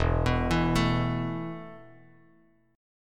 E Suspended 4th E, down, Bass Standard; Esus4 arpeggiated

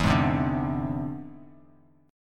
E Augmented 7th E down Bass Standard

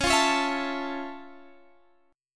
F Augmented Major 7th C sharp, guitar chord

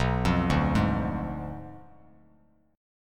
B Diminished 7th B down Bass Standard; Bdim7 arpeggiated

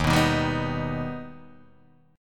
Eb Augmented Eb down Guitar Half Step Down

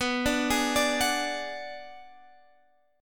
B-Minor 6th B down Guitar Standard; Bm6 arpeggiated; JGuitar

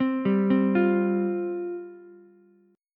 G 7th Suspended 4th G down Ukulele; G7sus4 arpeggiated

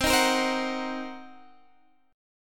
Eb Minor 6th C, guitar chord

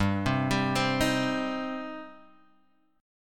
G Suspended 4th Sharp 5th, Gsus4#5 chord; arpeggiated; JGuitar

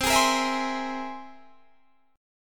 C Augmented 7th C down; strummed

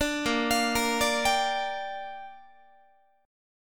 A sharp 6th, guitar chord; A#6; arpeggiated

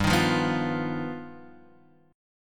G Augmented 7th, Guitar Open F; G+7 strummed; x,2,3,0,2,0; JGuitar

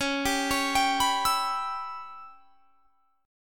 C sharp Minor 7th Flat 5th C sharp; C#m7b5; arpeggiated

